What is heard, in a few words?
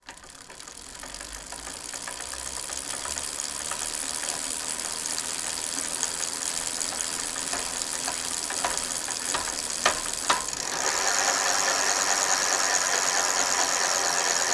transport bicycle pedaling fast stop sprocket then brake